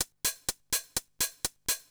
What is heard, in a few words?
HIHAT HOUSE 125, Drum; eJay